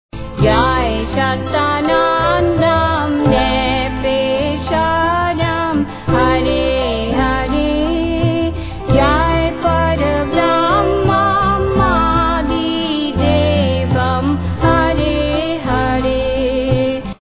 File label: mantra